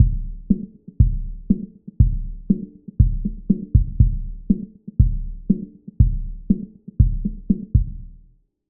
Drum loop LPF 250 Hz

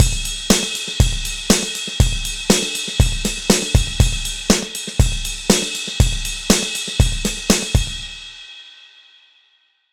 Drums High Shelf 6 kHz Boost 9 dB

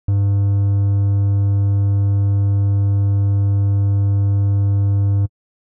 Triangle 100Hz

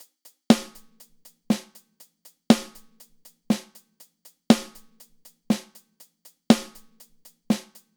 Snare HH Unbalanced

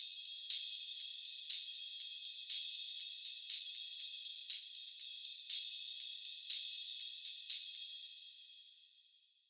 Drum loop HPF 6 kHz

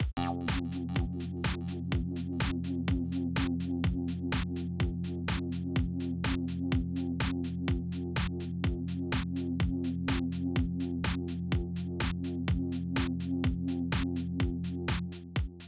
LFO mode free

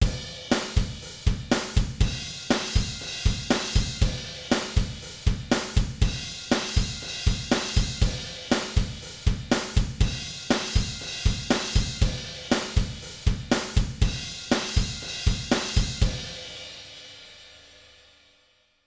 Drums Limited Compensated

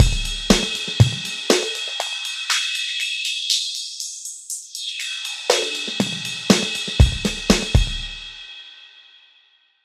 Drum loop HPF sweep with resonance

